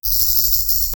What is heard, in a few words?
big spider attack